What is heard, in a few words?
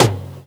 TOM, TOMS KIT, Ragga & Dub Reggae